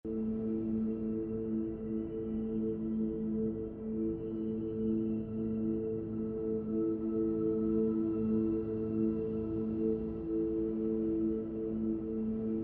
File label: AMBIENCE